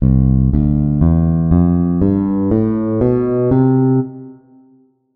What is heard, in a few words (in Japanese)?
ベース ドレミ